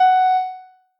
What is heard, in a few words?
noteblock f sharp 2; mesecons